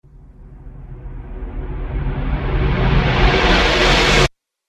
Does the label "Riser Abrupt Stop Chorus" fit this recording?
Yes